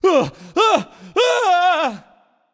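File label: nv fear, male; cernunnos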